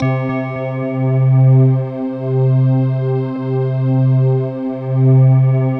BELL C, Slow Bell Choir; Optical Media International - Sonic Images Library

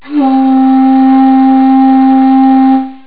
shakuhachi; Amiga Soundtracker Sample Packs

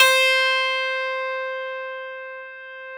pno C3, Clavinet; ProSamples vol.53 - Classical Prepared Pianos; Total Piano

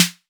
808 SD Orig, Snare Drum; Tape808; Goldbaby Tape808 & Tape909

